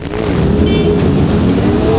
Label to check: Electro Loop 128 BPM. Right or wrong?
Wrong